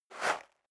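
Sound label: step dress boots gravel light slide short, footsteps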